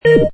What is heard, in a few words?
Transit, Servo Panel Sound; Multimedia; Servo Panel Sound - Transit 01; Buttons; Lynne Music